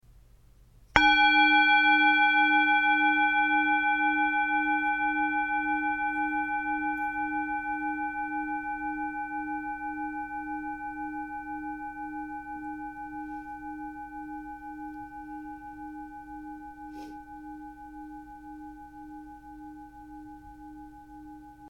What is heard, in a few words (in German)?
Klangschale